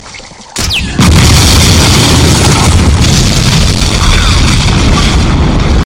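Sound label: battery explode ringtone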